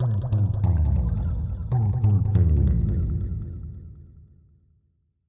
descending echo bass; Basses

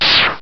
fire; VIDEO GAMES; vanguard

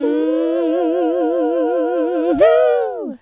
female vox old stylee; VOCALS and SPOKEN WORD

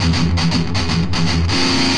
black metal crunch guitar; GUITAR LOOPS

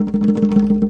arabic perc, Arabic Percussion Kit

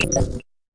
fx drum, Electro FX

